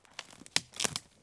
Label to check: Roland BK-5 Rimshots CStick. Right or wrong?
Wrong